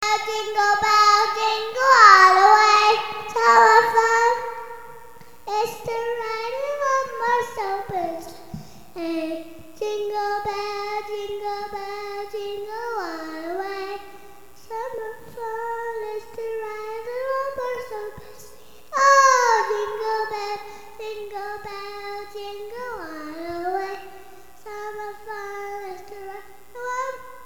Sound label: jingle bells